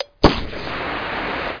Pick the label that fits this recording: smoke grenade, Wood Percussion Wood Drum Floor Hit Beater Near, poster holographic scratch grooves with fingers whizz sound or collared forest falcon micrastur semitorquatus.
smoke grenade